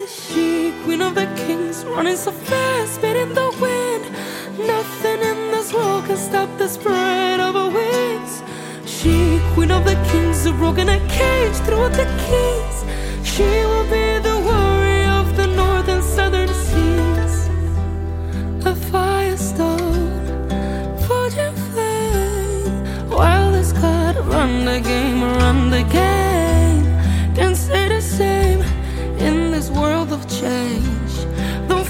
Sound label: acoustic